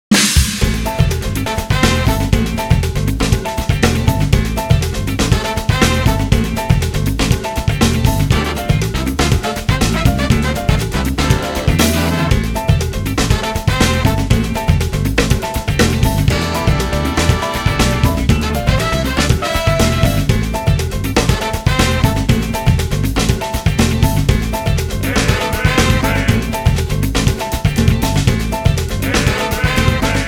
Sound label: Salsa Funk